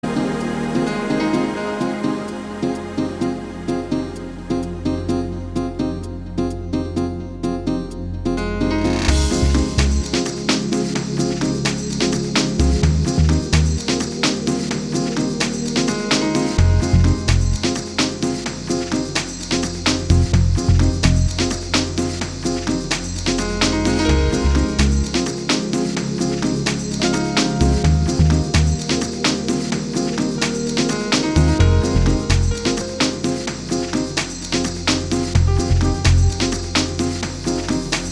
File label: ambient mix